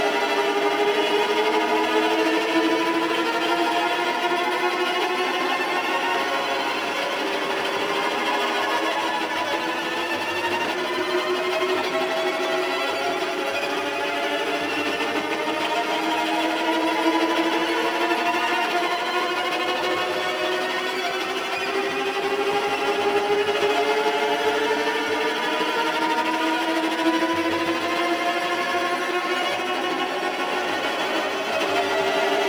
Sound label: Fear Increasing Loop; Sunless Skies Sovereign Edition; PS4